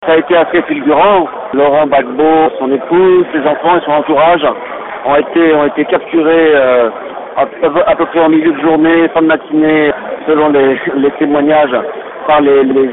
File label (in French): une ambiance électrique